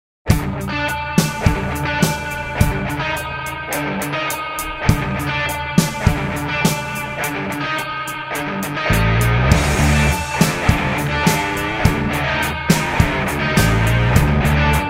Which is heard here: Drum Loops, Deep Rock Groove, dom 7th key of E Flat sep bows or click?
Deep Rock Groove